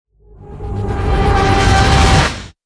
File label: Rip Wipe; Ninja Tracks